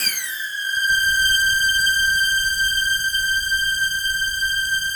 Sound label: SQUEAK G5, Yamaha VP-1; SQUEAKSTRNG2; Music Store